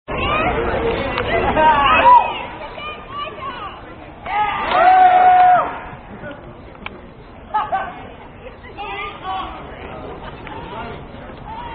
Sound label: Chants, Sound Off, Duckworth Chant; Various Phi Sigma Sigma Chants